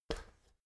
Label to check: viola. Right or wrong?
Wrong